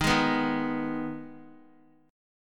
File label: D sharp Suspended 4th Sharp 5th; strummed; JGuitar